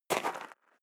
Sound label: step leather shoes gravel light single left